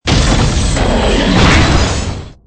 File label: death, robot; mob